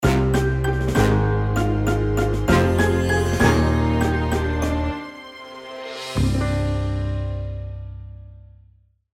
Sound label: lose; gesource; player; Janus